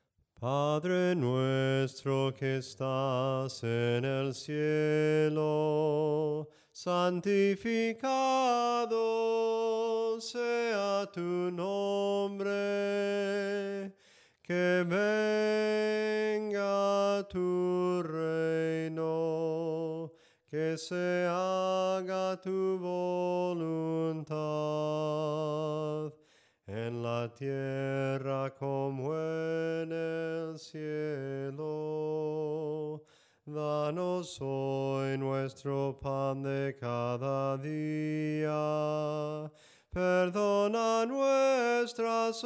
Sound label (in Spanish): SOPRANO CANTADO, himnos; PADRE NUESTRO